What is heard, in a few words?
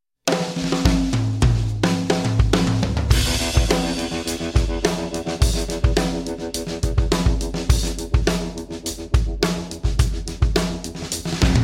drums with 4 mics; Quartet; U87; SM